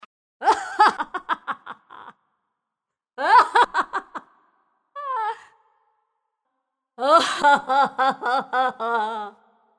zloj i dovolnyj smeh; Для Хэллоуина; смеха ведьмы; Коварный злой смех старой ведьмы, забавный смех

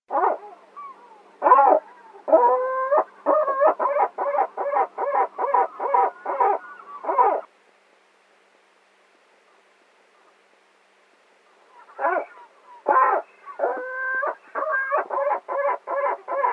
gorlovye zvuki krupnoj morskoj chajki

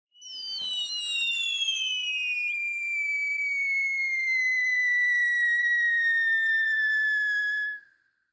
dolgij svist petardy; Звук петард

